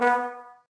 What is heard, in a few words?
B Trombone